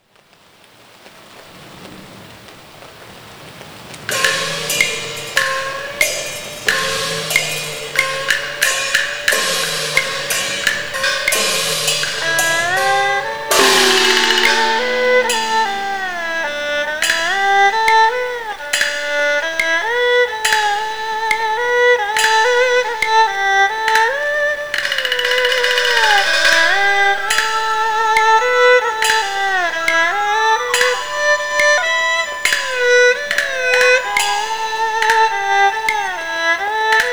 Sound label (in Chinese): 序曲